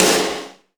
clicky snare g sharp key, Loudest frequency: 3384Hz; snare drum samples; one shots